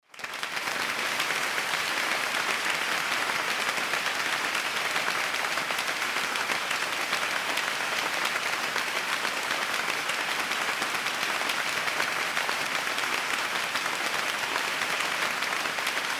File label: Applause Long